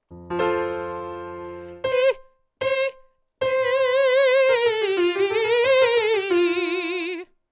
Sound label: E Glottal Stops, exercises for breathiness